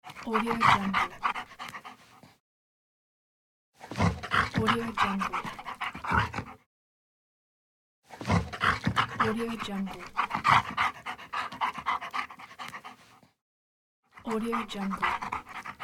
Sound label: dog panting